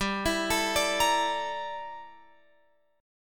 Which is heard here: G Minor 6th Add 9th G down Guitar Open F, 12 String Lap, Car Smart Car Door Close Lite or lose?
G Minor 6th Add 9th G down Guitar Open F